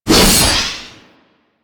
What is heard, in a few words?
Glaive Throw; Enemies; Warframe; Infested; ZealoidPrelate